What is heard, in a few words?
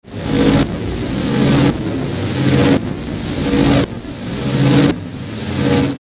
Electrical Pulse Crescendo Phaser; Production Element Imaging Element Accent Transition